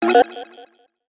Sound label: strange error